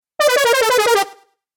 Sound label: Videgame Failure Fx; Video Game Sound Effects